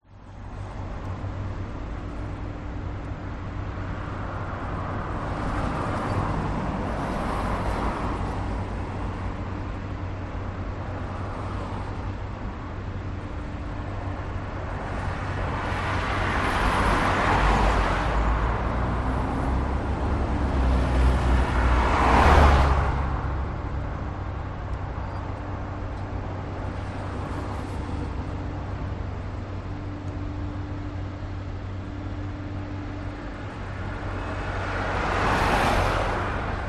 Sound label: traffic pass out of town road day time quiet